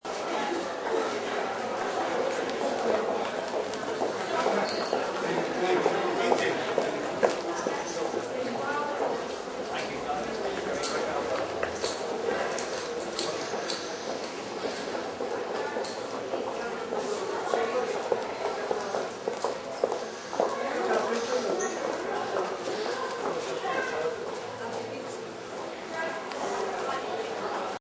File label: Trafford Centre Downstairs, Shopping mall crowd ambience. Trafford centre, large mall.; Recording from downstairs. Buzz of indoor pedestrian traff